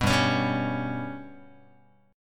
G Major Flat 5th G down Guitar; GMb5 strummed; JGuitar

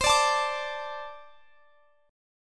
A Minor Double Flat 5th

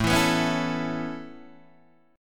A Major Add 9th A Guitar Open D, chord

